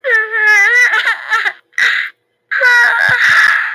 crying; sheila rae

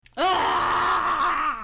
aghhhhhhhhhhh

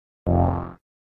spear appearing; undertale

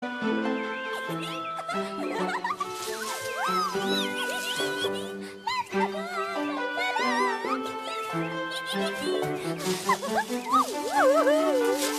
cute noises

